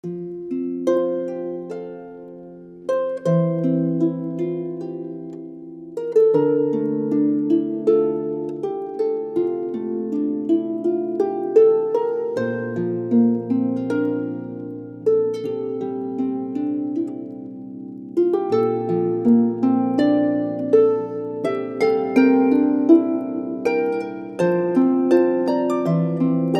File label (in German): harfe orientalisch